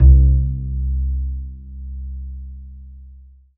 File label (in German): KONTRABASS C; Alpine Volksmusik